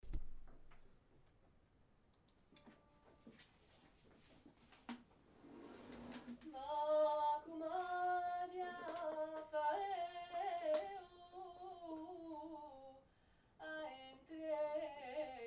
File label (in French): bassu; Ateliers chant